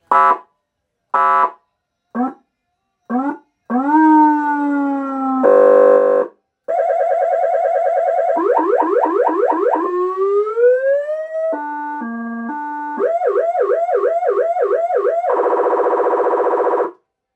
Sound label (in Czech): profesionalni vystrazny system s mikrofonem 200w 24v